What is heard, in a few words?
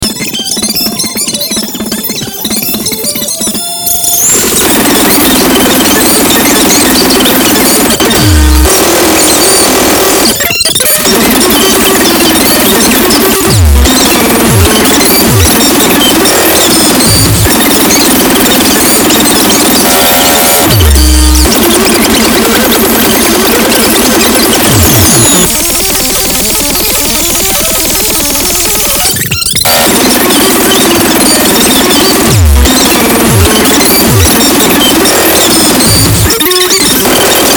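heavy metal extratone party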